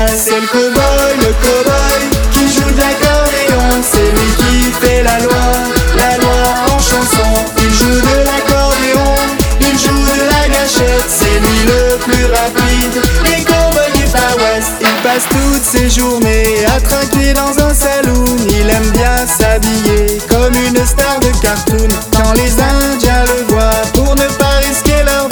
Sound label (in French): extrait le cowboy joue de l accordeon